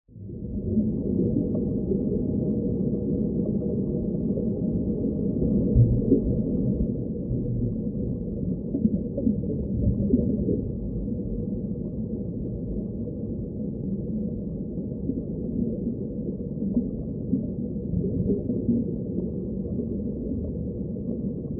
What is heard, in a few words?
ambience underwater coral sea; lukas tvrdon